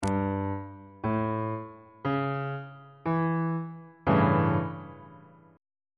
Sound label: a dominant 7th 3rd inversion on bass clef